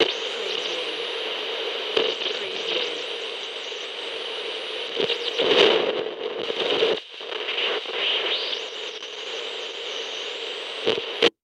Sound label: CB Radio Static